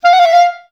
F4, orchestra; Best Service; Peter Siedlaczek's Advanced Orchestra

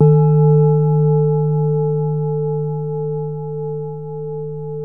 BOWL, Tibetan Bowls

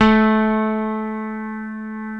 CP70 D5, YAMA.CP70; East West; Global Sounds

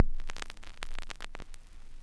X CRCK L, RECORD-FX; XP RECORD-FX; Best Service; XXL Production Effects

